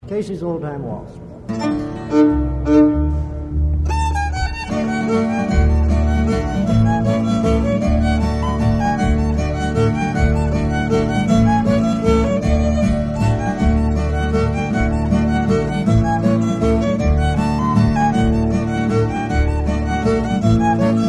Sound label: Old Time Waltz